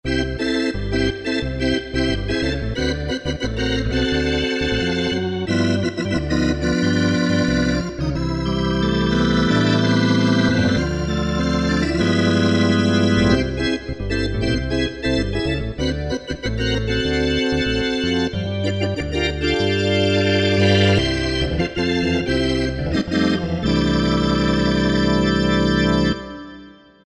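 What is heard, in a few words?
Organ, Yamaha EX5R; Factory presets; Or-Early Bird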